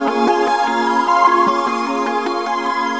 SYNTH D+B 160 C, Drum+Bass, Keys; eJay

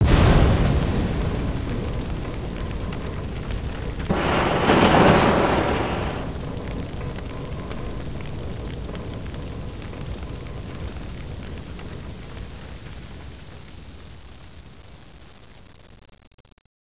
large tinkly bang; environ; Gunlok

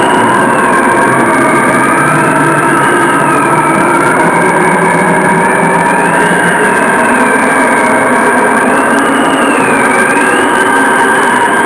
Cold Arctic Wind; Soundsation; Nature

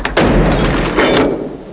explode

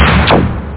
bash; galaga 94

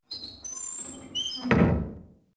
TC DOOR, door abandoned; PC Gamer IT CD 40; zones

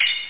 tambor, cymbals; Workbench Add-On - Volume 1

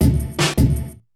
drum loop D+B 160; Drum+Bass

